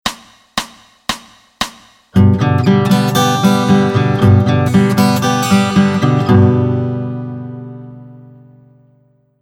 Hybrid Double Pluck

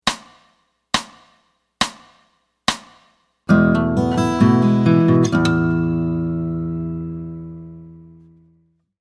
Open Chords With Fingers E

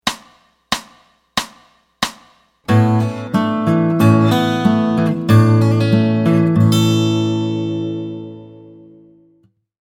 Open Chords With Fingers A

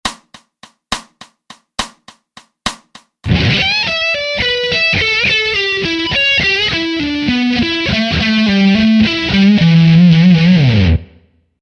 Another Bluze Lique (SLOW)

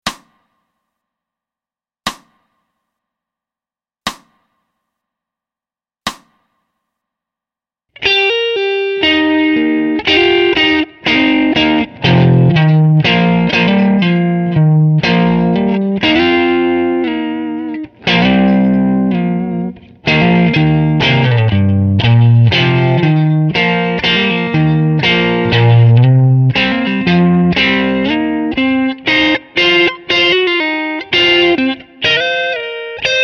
C MIN PENT Rip

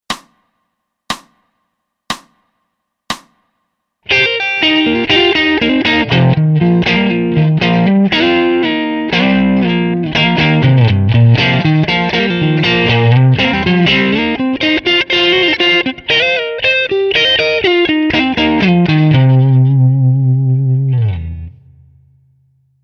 C MIN PENT Rip (FAST)

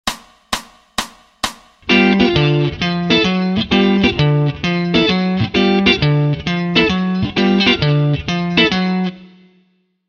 Walk Bass Lines; Bassline Pattern: 1-5-b7-7-1, etc.; FAST

